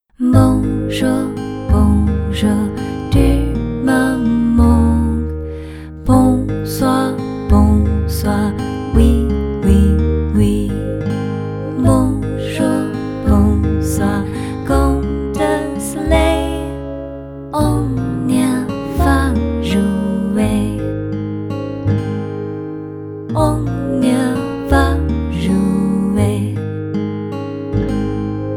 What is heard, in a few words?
music